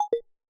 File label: walk; ゆめ2っき